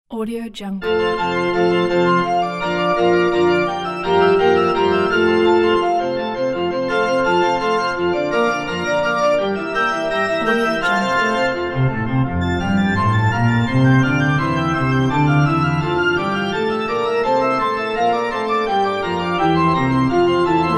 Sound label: wedding organ prelude in f major